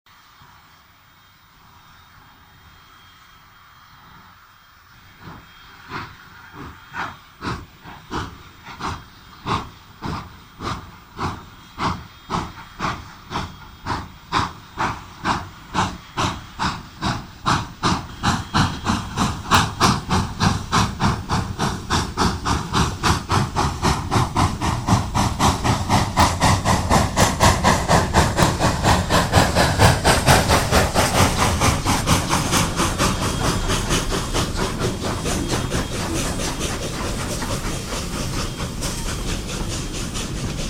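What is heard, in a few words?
steam locomotive starts moving and picks up speed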